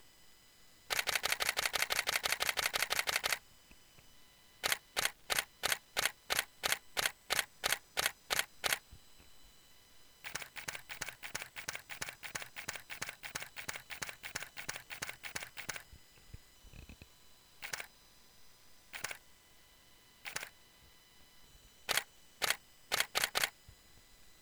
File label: 5dmk3 shutter